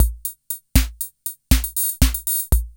5-4 BEAT, KR55; INIS - Funky Xtreams; KR55 LP2

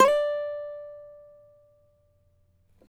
CAVAQUINHO SLIDE, Guitars; Spectrasonics; Hans Zimmer Guitars VOL-2

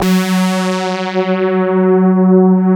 CMI PIANO; Wizoo; Powered DX

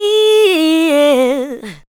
ADLIBS

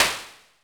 SNARE GRIT, SYNTH PERC; Zero-G; Ambient 1

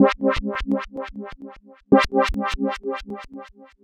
pad out A 125, synth loop; Zero-G; Soundclash; Nu Dance Electro

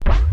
tape fx, scratch; Ambient Grooves

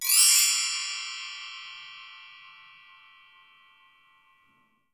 Percussion & Harp; Miroslav Vitous

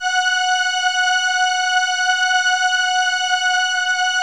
CRUMAR; Masterbits - Vintage Voltage; CRUMAR PERF

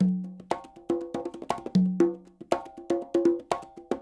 SHUF, Percussionworks; Sampleheads; NYC Percussionworks; SF LOOPS 120

